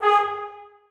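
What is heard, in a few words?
trombones staccato g2; Sonatina Symphonic Orchestra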